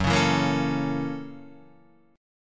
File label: F sharp 7th Sharp 9th F sharp down Guitar Half Step Down